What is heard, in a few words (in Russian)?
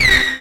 knopka cifrovoi zvonkii visokii aktivnii